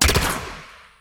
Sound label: shot